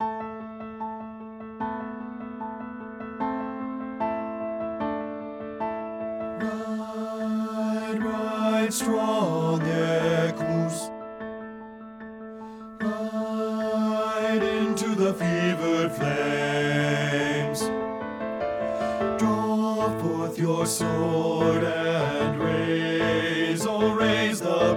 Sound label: BASS; men